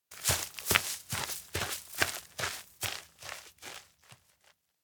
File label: steps outdoor grassland walk brisk; ken; anemoi